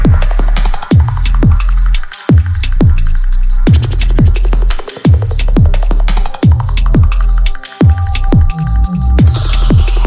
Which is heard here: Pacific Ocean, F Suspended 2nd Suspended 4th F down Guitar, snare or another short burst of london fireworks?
Pacific Ocean